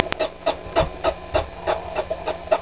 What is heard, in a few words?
prairie dog call